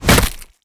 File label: headbutt, zombie impacts; creatures